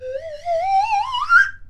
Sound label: Wobble Rise, Slide Whistle